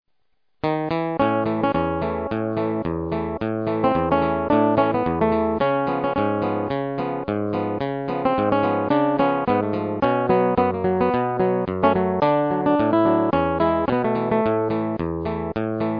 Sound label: Godin Nylon